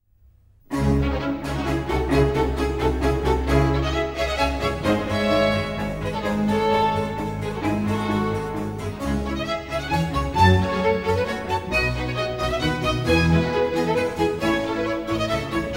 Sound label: Cello Concerto in D Minor; Vivaldi; RV 406